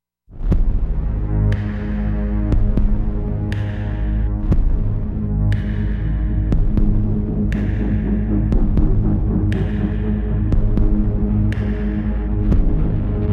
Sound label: Grid No Hi Synth; Sound Design